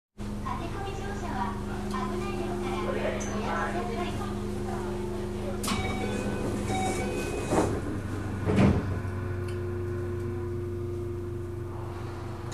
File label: keio 8080